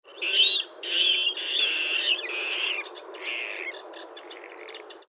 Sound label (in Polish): wodnik, waterral roep; peterboesman